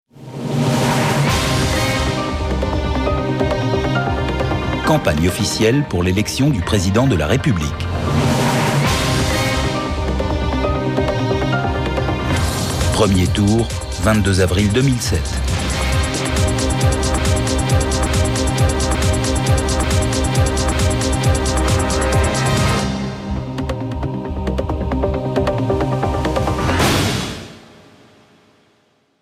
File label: jingle